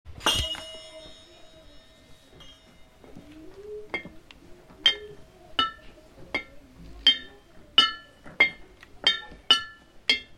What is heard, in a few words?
swing low mix